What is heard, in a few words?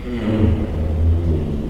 call, giraffe; zoochosis pack1